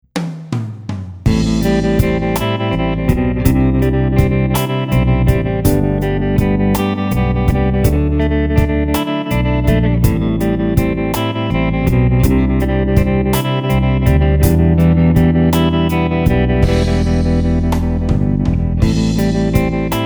patch demo

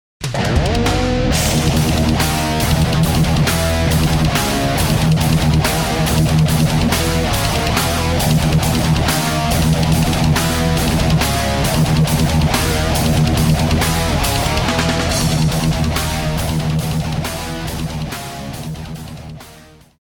heavy rhythm; gs10

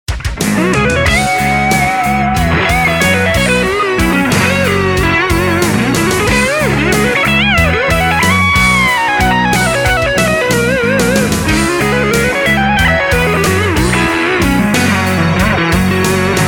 brit combo; Micro Cube red

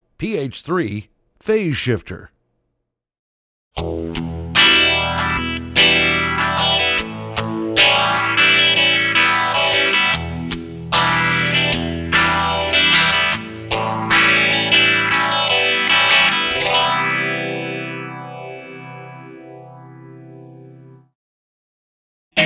tonedemo

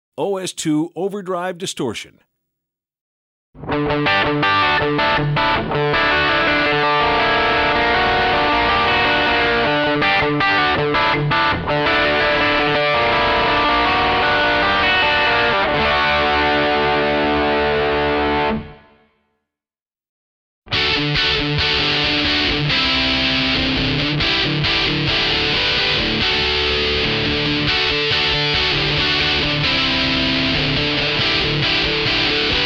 tonedemo

